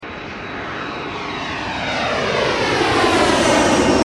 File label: jet take off and fly by sound effect